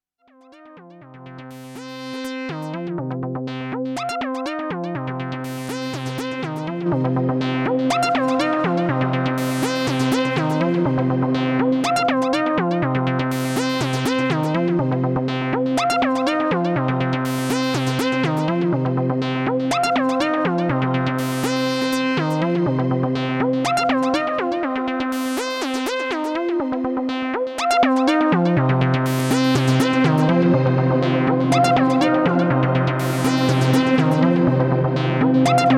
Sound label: Synthesizer Reverb Shimmer Oceans 12